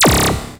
scifi, SCIFI & SWEEPS; Techno Trance Essentials

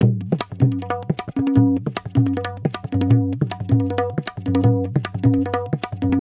tabla, 155 bpm; Zero-G; Pure Tabla

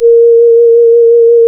Synclav FM, WIND, A#; Big Fish Audio

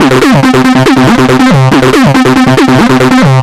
Synth

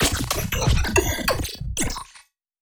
nice glitch

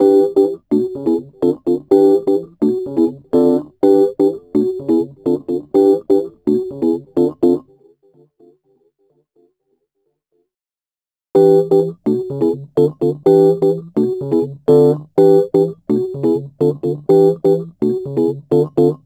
Rhodes Attacks; Ueberschall

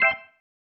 Stab, Organ, One Shots

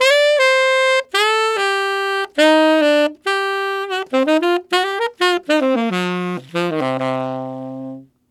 Tenor RnB in C min, Tenor Sax Loops, Jazz; Sonic Foundry (Sony Creative Software); Jazz Solos and Sections

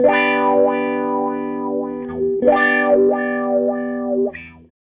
SG Guitar Loop

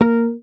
MUT A#3, Bass; HAMER12MUTES

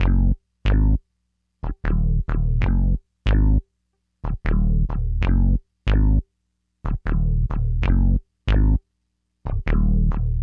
Hip Hop and R&B Drumloops

